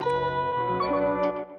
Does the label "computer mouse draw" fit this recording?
No